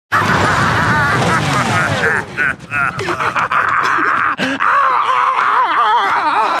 risata malefica, effetto sonoro clown; pennywise

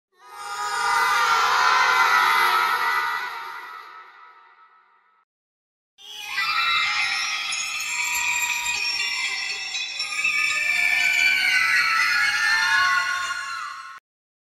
suoni bambini inquietanti effetto sonoro sounds creepy

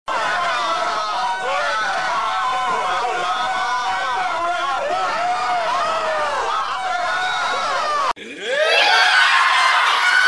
suono di persone che vanno in preda al panico

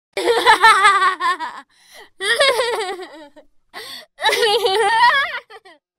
suono bambina che ride effetto sonoro creepy laugh scary horror

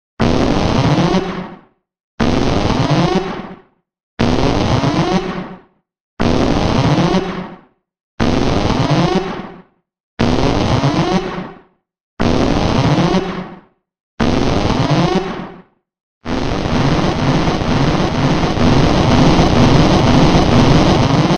allarme spaventoso effetto sonoro; sounds scary

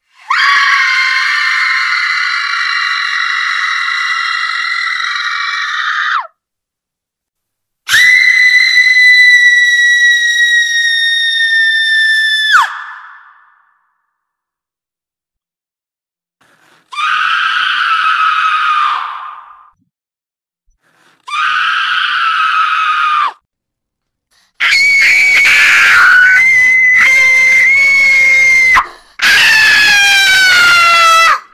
urla di donna terrorizzata; effetto sonoro ai shorts

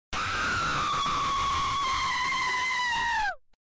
horror sound l urlo piu pauroso di sempre

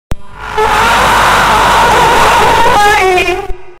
urlo troppo pauroso, scary

